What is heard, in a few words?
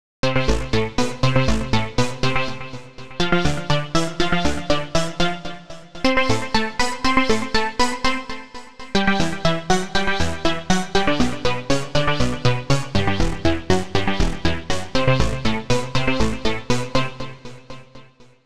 seq 8 slow groove with sub